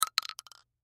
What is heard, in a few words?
Bullet Shell; Bullet Shell 12 Gauge Drop Cement; Drops (Sound Effects:Objects); Blastwave; Warfare